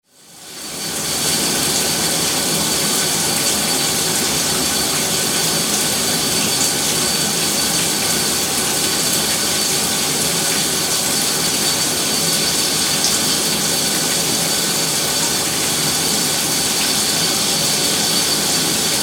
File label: shower